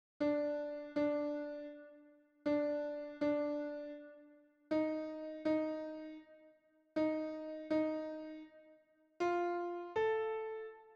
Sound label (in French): piano alto